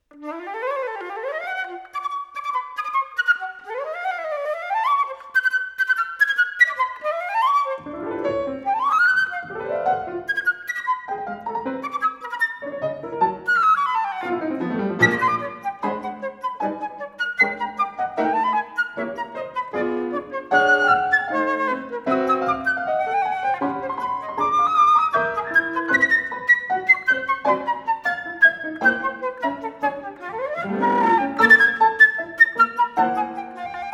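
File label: Sonata für Flöte und Klavier; 2. Satz; Op.21; Salvador Brotons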